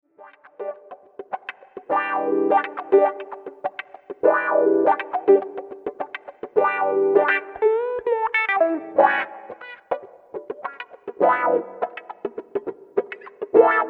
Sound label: wah wah rhythm guitar